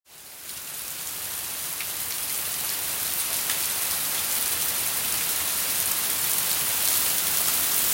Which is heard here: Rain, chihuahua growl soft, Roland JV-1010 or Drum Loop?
Rain